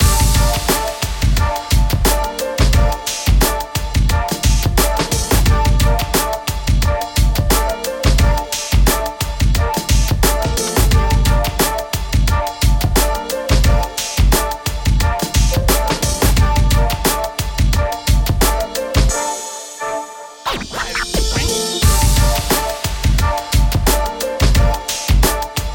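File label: HIPHOP bpm88, ヒップホップのビート・トラック、リズムトラックのループ素材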